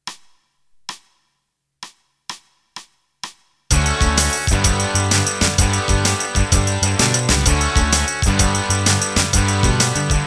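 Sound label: Shuffle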